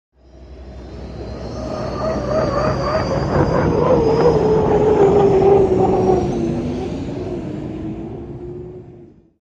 Hydroplane By Wstar

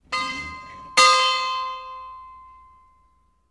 Small New England, bell towers

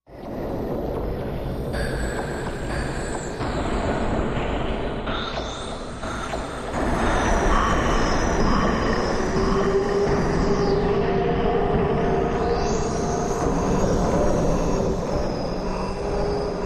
Dist Fear Subway Tunnel, sci-fi ambience

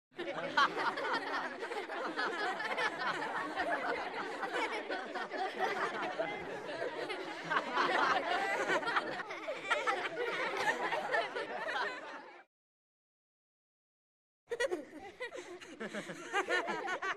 Small Group, kids; Ages 3-12

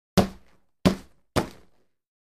Boxing Bag Hits

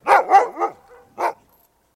Dog Bark Husky Defens

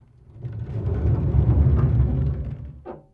Large Metal Sliding Door, Open.

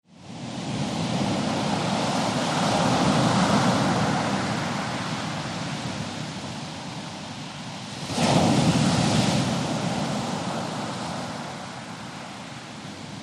Heavier Warm Surf, waves & surf

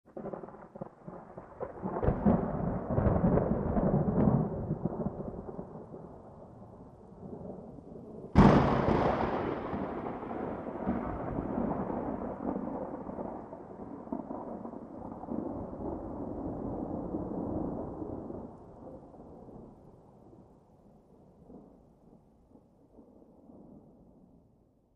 Thunder; Close Explosive Ground Strikes

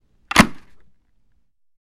Football Helmet

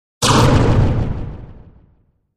Bell Bell Chime with LFE, Reverb

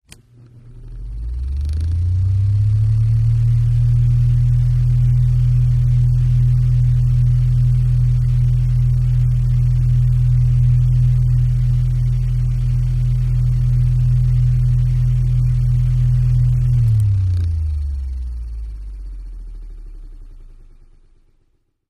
fan portable hi, fans

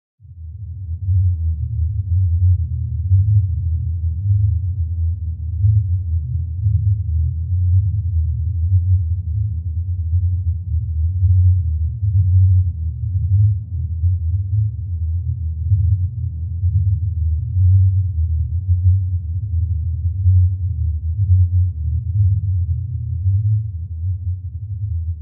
LFE Fluctuating Electronic Buzz with LFE

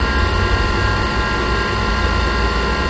Ibm Xt Computer Run, fans

